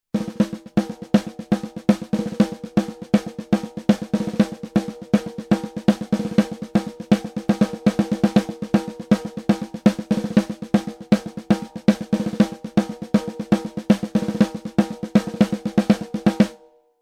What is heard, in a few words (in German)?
V7-X SD 45° Winkel; sE Electronics